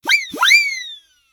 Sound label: notification; samsung Aleart